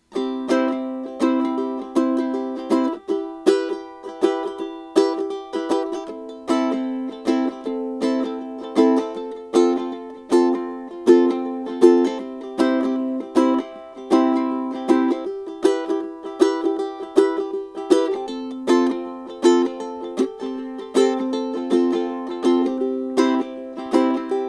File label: thomas rein ukulele palo escrito